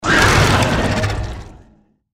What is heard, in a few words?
gecko combat idle; npc